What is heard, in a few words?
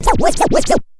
SCRATCH